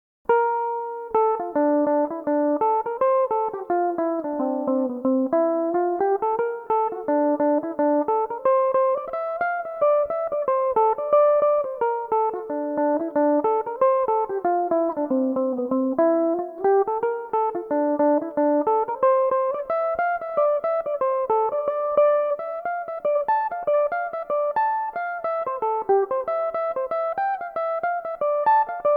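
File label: D minor, irish mandolin, tunes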